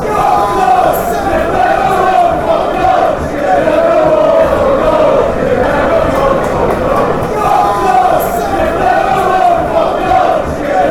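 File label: youve never won fck all, football chant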